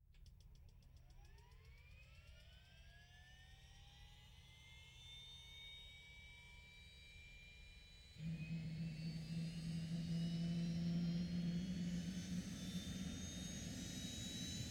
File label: Plane engine startup; KMP Film Video Sound Effects